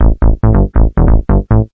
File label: techno bass; lmms